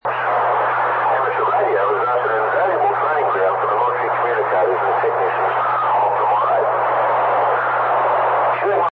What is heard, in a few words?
Substandard Fm Relay